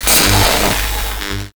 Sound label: Void Dragon Ring Sparks; Enemies; Gameplay; Warframe; Duviri